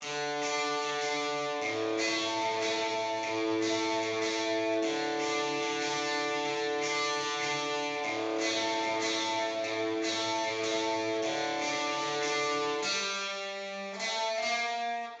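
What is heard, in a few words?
rhythm reverb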